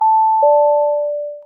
audi belt

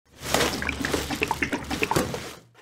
trash

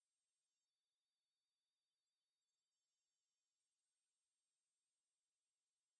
tinnitus; Flashbang

